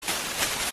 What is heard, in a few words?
leaf sound short